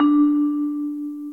dong; lmms

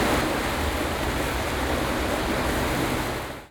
water fill; canal lock; gcompris-qt

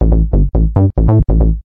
techno bass; lmms

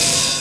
hihat opened; lmms; drums

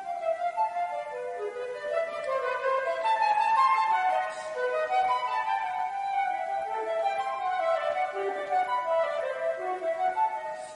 flute traversiere; instruments; gcompris-qt

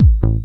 bassdrum; lmms; drums

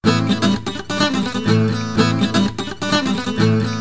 latin guitar; lmms

